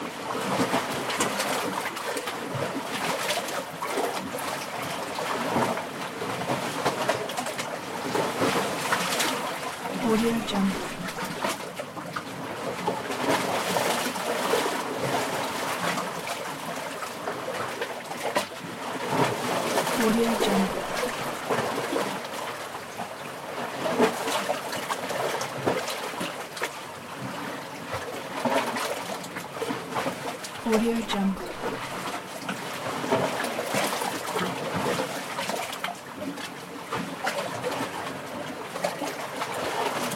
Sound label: water lap rock coastal barrier loop